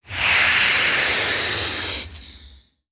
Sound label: Pets Tortoise Angry; creatures; Lego